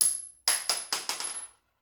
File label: Bullet Shell; Sound Ideas; Guns